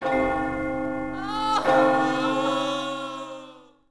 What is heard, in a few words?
Plague; Sid Meier's Civilization 4 Complete; Beyond the Sword